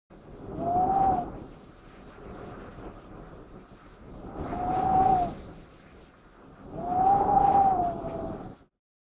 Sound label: Wind blowing through a window